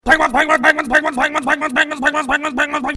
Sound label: Penguins